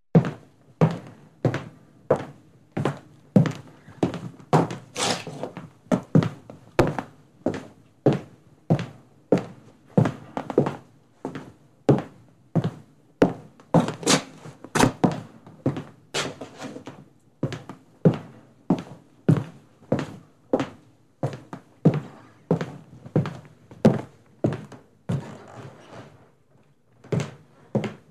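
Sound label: stomping on floor in boots; topot po polu v botinkah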